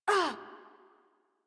Generic Short Pain Operator Voice

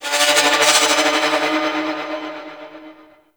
Asteroid Metal Groans And Scrapes; Warframe; Grineer

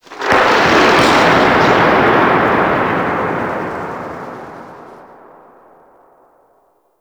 Shipyards Thunder One Off; Ambience; Warframe; Grineer Shipyards

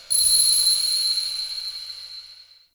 Interior Orbital Station Ring; Warframe; Tau

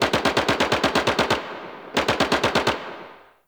Distant Gunfire

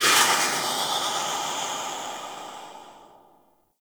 Ship Machine Steam Blast; Warframe; Corpus Ship Remaster

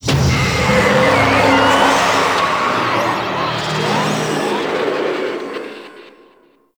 Zoo Wave Pain Stinger; Warframe; NineteenNinetyNine; Stage10TheZoo

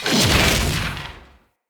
Fire Blade Throw; Characters; Enemy; Abilities; Sinecure; Banneret

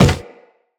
Walk Wood, Footsteps; Operator

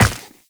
Run Ice, Footsteps; Warframe; Characters; Tenno

